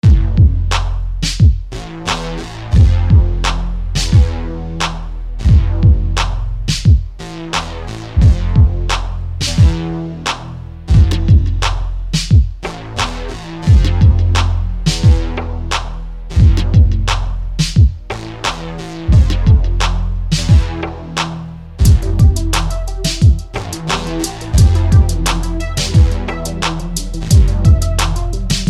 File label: Crashing In 88